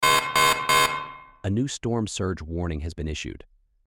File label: storm surge warning